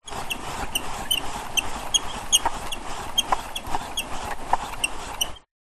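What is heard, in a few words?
office paper shredder manual handle turn squeak no paper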